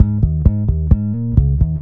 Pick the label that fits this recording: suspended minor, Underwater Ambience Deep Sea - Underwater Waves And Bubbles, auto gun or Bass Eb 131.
Bass Eb 131